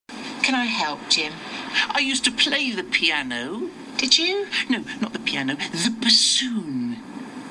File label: piano